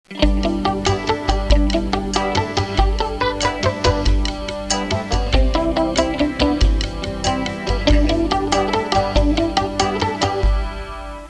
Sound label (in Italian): suonerie musica hawaiian